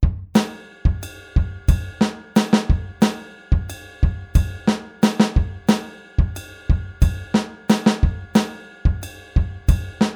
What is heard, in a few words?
Groove off